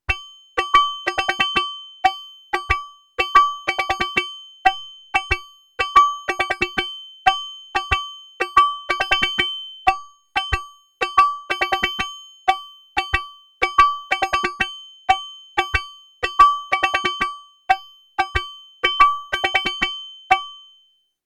Qun Mk2 White Noise Resonator; Nunomo

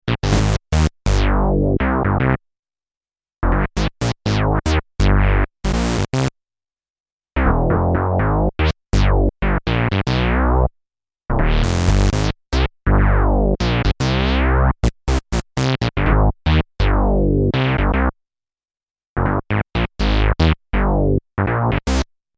Bass

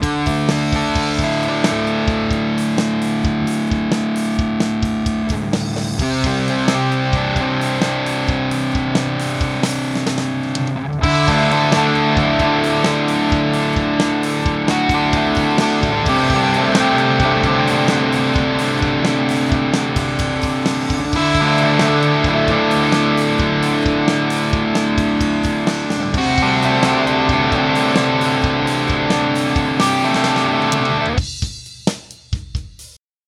Channel B Distortion

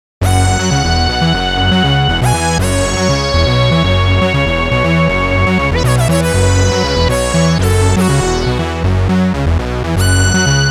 OB-E Split Bass Rezzy Lead

